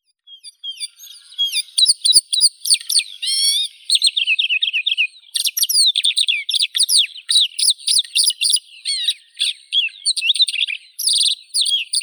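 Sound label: white-winged lark Melanocorypha leucoptera, nature sounds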